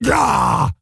Stonebark Wound; Elder Stonebark

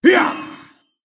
Wound; Stormcaller Brundir

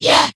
Attack; Tenebron

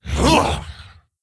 Attack; General Vezax

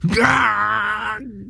wound crit; Moorabi

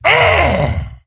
Wound Crit; Kologarn

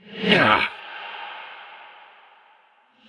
Wound; King Terenas

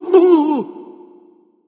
Roar Wound

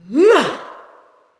Attack Light, Creature; Eydis

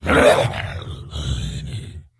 Wound Crit; General Vezax

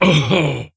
Wound; Ley Guardian Eregos

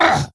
wound, creature; Shadron

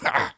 Wound; Voljin

